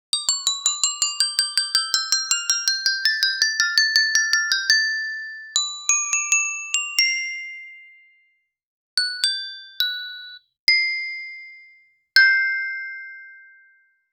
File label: xylophone play